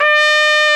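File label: BRASS, ORCHESTRA; Roland LA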